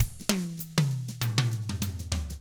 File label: FUNKY Drums